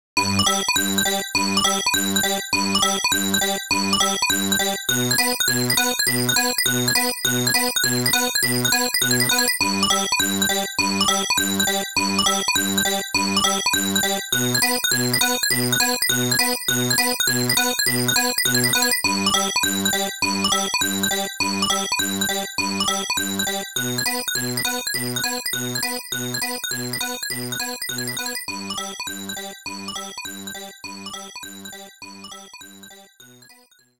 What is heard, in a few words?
Name Register